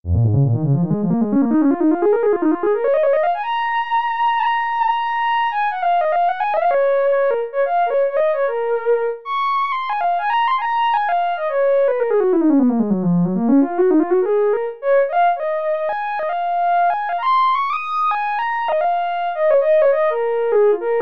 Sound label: Standard Lead